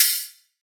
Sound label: Open Hihat; Cymatics; Humble Hip Hop Sample Pack; Cymbals